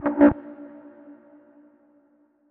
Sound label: error